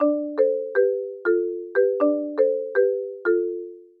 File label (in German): Glücklich Ring